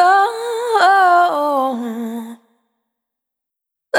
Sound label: Spin, Single Voice Melodic Loops